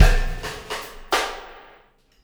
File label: Wormhole Beat, Processed Drumkits